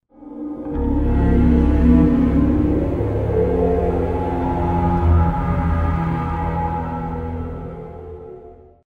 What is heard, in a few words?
stinger song, music stingers; hl2